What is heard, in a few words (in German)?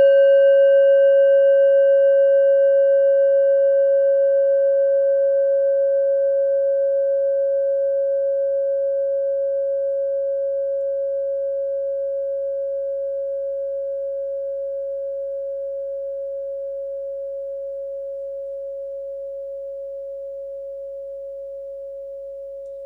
klangschale set; Klangschalen-Chakraset; Gewicht = 360g; Durchmesser = 11,2cm; Höhe = 5,4cm